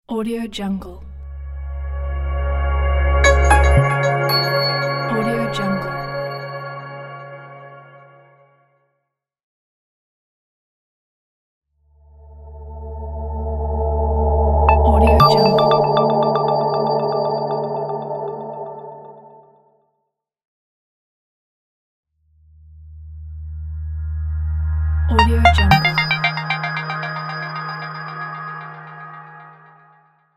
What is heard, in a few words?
airy logo pack